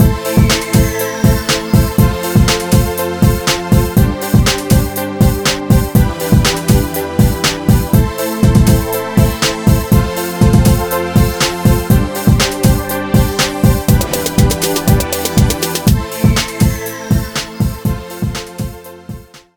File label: Mood Muzik; imaschine